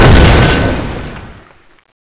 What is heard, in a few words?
dash end, scorpion